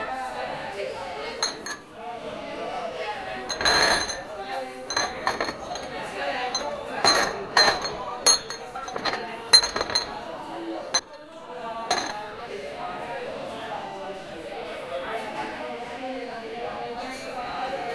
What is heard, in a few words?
ambiense of a bar in, bar